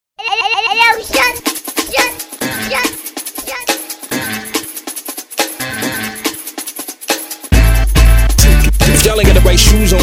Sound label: jungle music